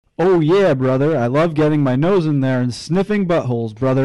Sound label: oh yea sniffing